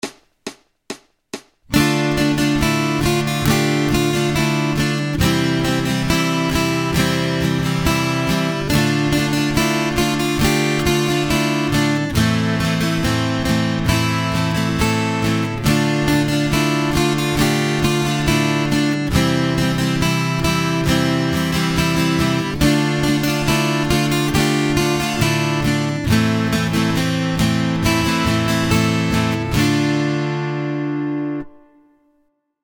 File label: Acoustic Accompaniment, acoustic guitar